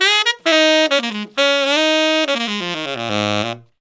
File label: SAX SOLO; Zero-G; Phantom Horns